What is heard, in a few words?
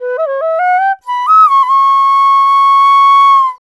INDIA ALTO